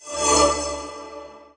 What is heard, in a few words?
enervation; faustus